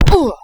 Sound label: fall